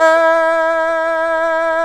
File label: ERHU E3; INIS - Opium